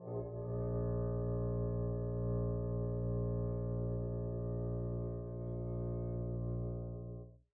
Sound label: arco bb1 pp up, double bass; dsmolken